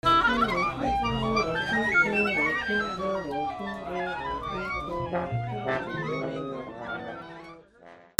MUSICAL

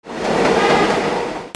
TRAINS

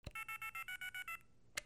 PHONES

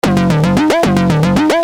RHYTHMIC

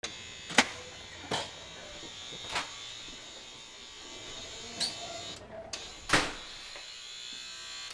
BUZZERS

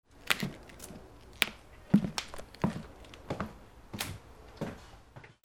FOOTFALL

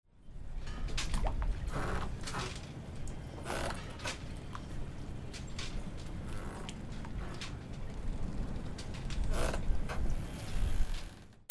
BOATS

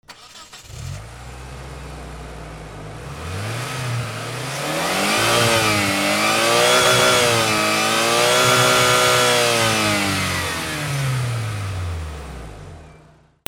CARS

